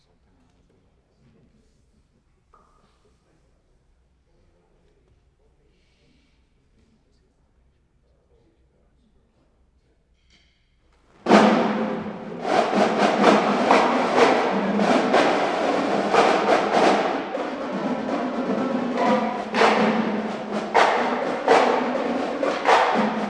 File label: Drum Solo; north star ensemble practice plymouth ma